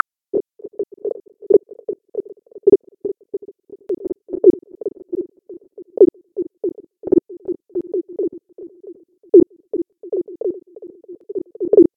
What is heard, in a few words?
Chatter Four Sonar